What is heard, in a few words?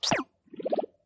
sneezing; animal crossing